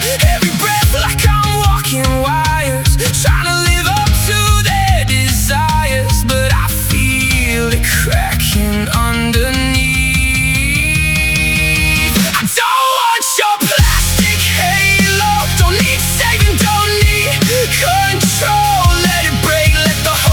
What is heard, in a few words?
rock song